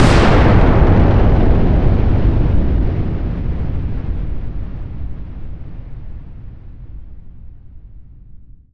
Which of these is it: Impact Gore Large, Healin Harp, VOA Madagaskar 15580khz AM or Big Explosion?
Big Explosion